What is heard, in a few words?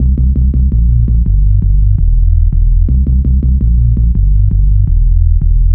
Menace Throb Bass, Bass Lines 166.5; Jungle; Zero-G Jungle Warfare 1, 2 & 3; 90 s Sample CDs